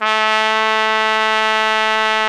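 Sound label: BRS, Brass Trumpet f; Roland L-CDX-03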